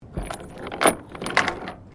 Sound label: Chain rusty drop, Chains rusted from sea air; Drops; Objects